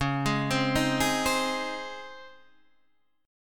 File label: Db Major 7th Suspended 2nd, Guitar, Standard; 9,11,10,8,9,8; arpeggiated; chord shape